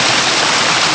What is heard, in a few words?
wave; Nintendo; July 2020 Gigaleak